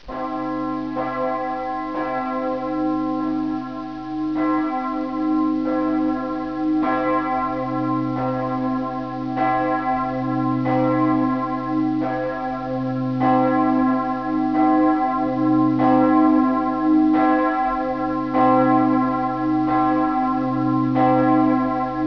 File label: ambience